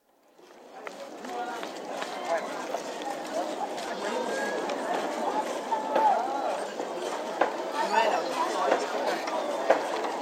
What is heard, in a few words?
Zaire: Kisangani, street atmosphere with some traffic, bike bells, car horns, footsteps & distinct speech; Outdoor Sound Effects; BBC